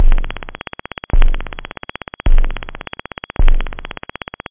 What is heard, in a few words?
flam, techno bd; drums